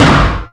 BIG DOOR, Anime Sound FX; AKAI Anime Sound FX

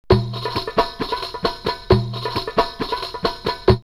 Kuwait City 134, drumloops World & Ethnic; X-Static Goldmine

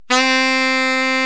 sax, EMU E-III, C3; ProSamples vol.55 - Retro Sampler; Sax Balzy

